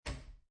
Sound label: Poker Chips Place One Chip Onto Another On Wood Table; Gambling Sound Effects; Lynne Music